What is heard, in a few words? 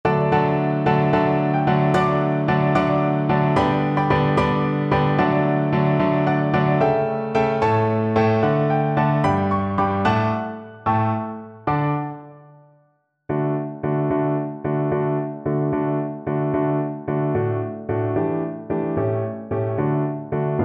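viola karaoke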